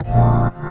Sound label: Bb STAB; Loopmasters; Funky Underground Vol 2